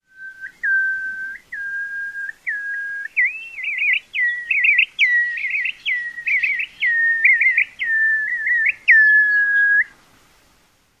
song

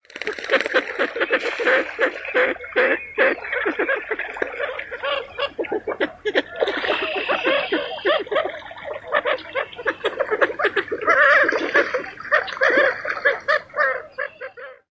courting group

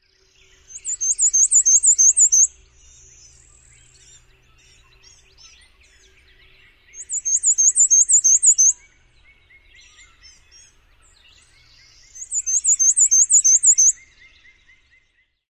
songs

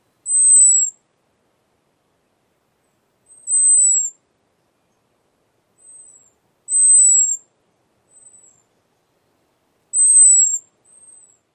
high seee hawk alarm

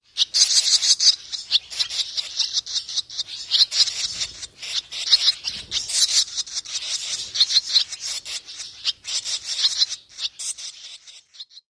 begging young

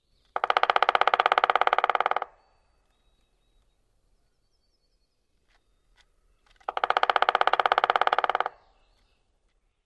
drums

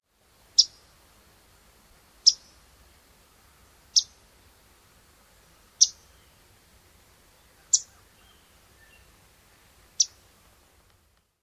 calls

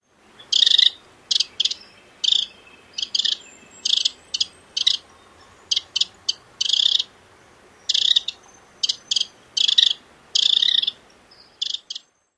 calls

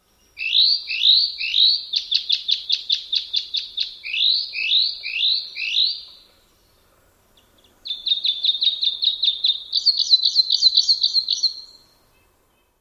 songs; NY

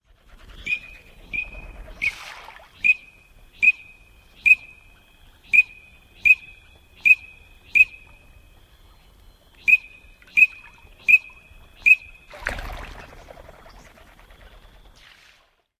male lands and peeps

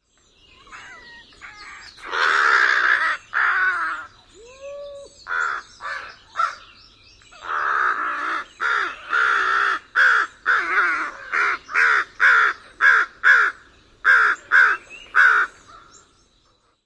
calls of family group

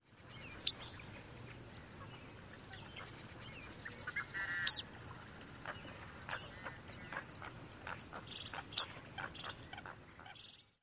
tink call

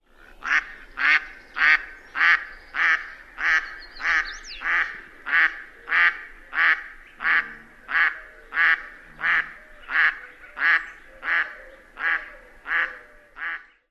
mexican female quacks

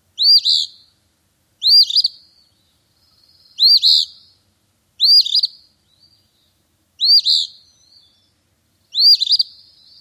song

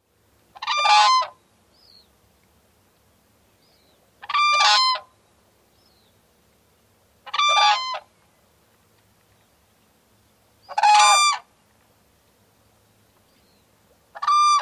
bugles

